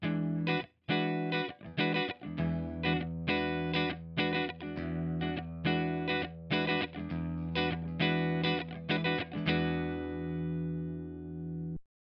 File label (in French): Test Cables clean tous